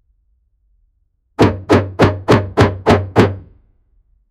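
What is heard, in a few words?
big hammer swinging in air; tools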